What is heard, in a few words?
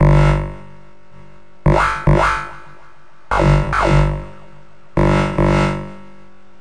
072 dubstep flapper, bass loops